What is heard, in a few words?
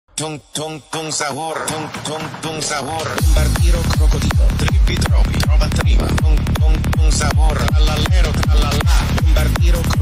Epic Sahur Beat